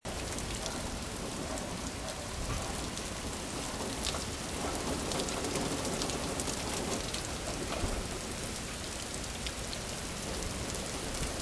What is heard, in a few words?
rain sounds